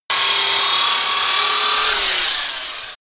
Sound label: sander on load screech